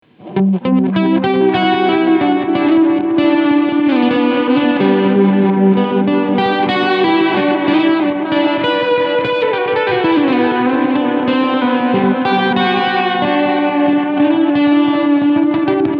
Pigtronix Echolution 3 Reverse Modus Mod 9 Uhr

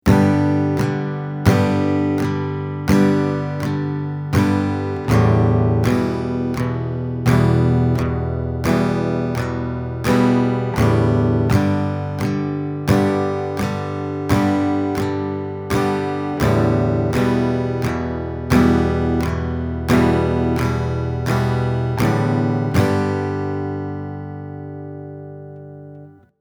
Akkorde wechseln Achtel slow

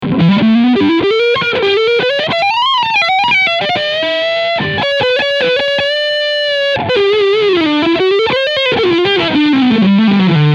Leadsound Hals PU

